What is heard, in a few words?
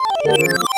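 Jingle Menu Return Title; Clubhouse Games: 51 Worldwide Classics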